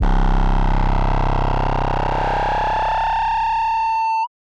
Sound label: 808 Sample C1; drum samples; Bombz